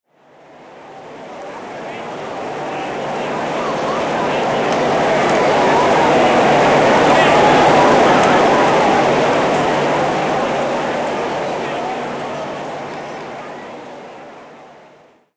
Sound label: Crowd Ambience; Pitch Black Audio Pong